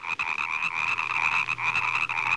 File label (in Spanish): rana; primartis; sonidos